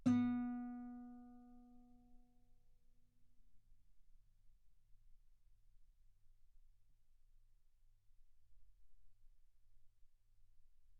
Guitar B3; ff; sul B

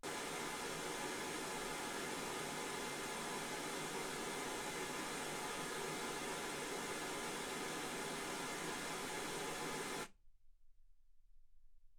wgn 48kHz

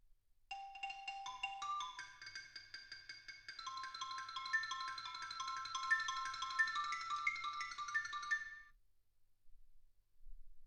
xylophone

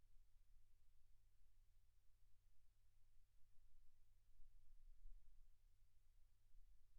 silence 48kHz

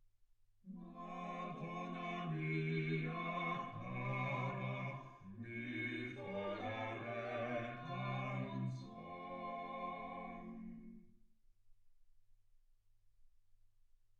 quartet

